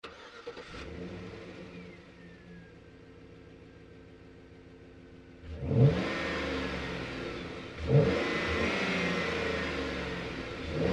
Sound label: Truck GMC Savana Onboard Start Revs Off Hard; 2010 GMC Savana; Big Room Sound